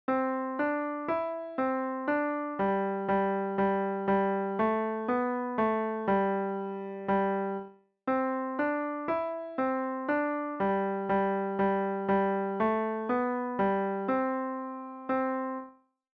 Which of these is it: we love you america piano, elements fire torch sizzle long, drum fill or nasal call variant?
we love you america piano